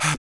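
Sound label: vox percussion, Vocal Planet Jazz FX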